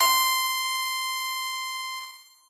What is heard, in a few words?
piano